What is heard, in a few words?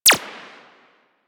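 machine gun tech; Weapons; Warframe; Archwing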